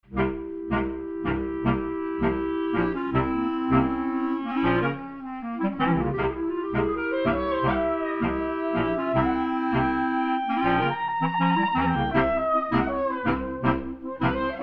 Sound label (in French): Freilach sans perc